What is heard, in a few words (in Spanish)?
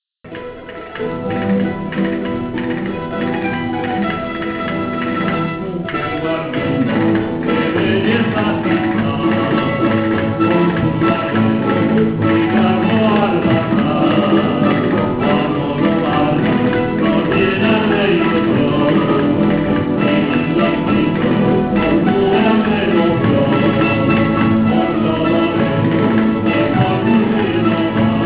barguenas con voz